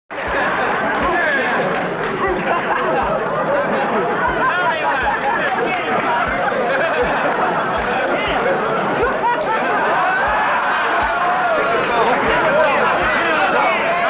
Muay Thai; ورزش موای تای

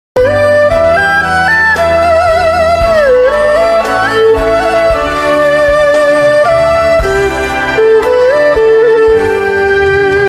Erhu

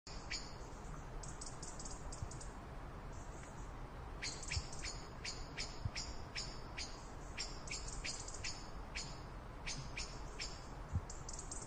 Foggy environment

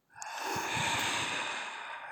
sigh

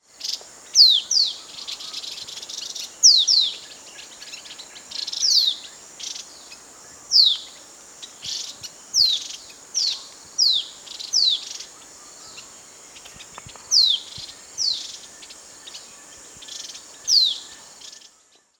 Striated yuhina, پرنده یوهین راه راه

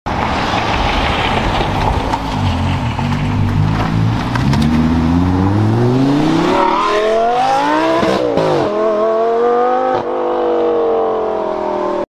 Lamborghini Gallardo

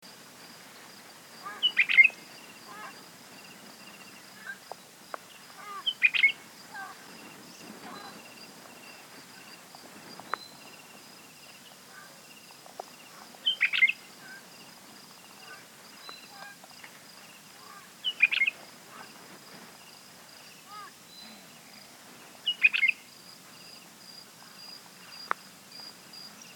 African red eyed bulbul; پرنده بلبل چشم سرخ

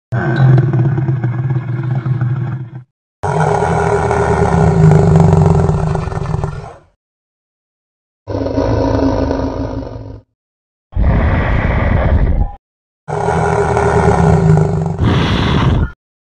Kouprey; گاو کامبوجی

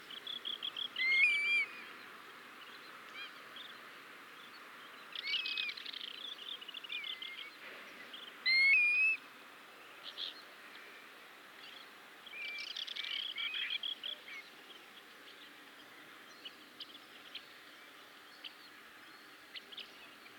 Grey plover

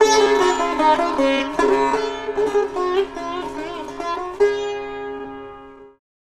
Strong Sitar; سه تار

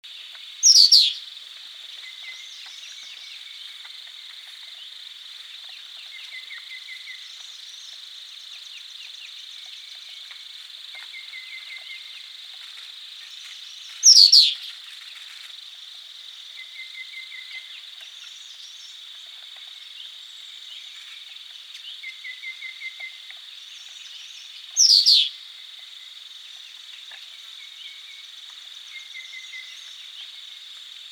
burmese yuhina; پرنده یوهین برمهای

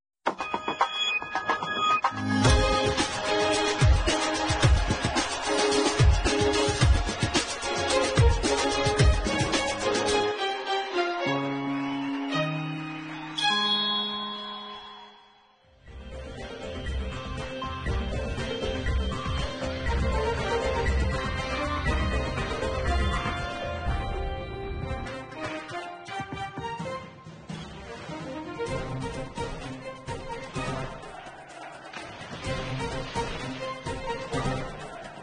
Sports news, تیتراژ آغازین اخبار ورزشی

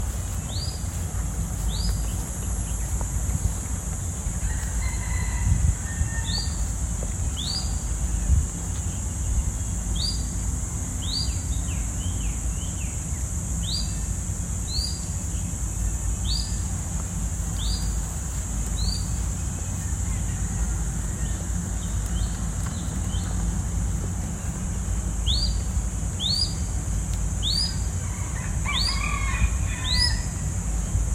Crested goshawk

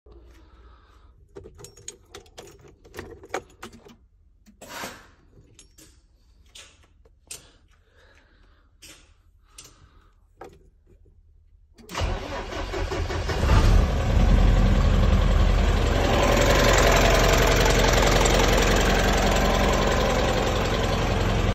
Renault Midlum 270 Truck; کامیون رنو میدلان ۲۷۰

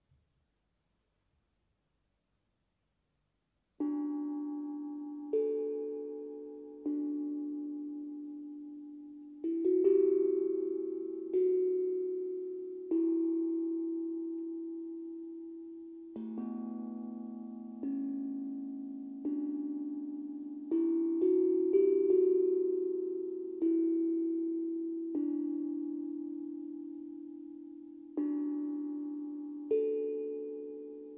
Tang drum

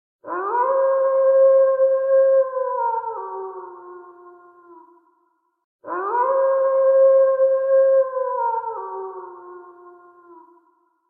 scary night

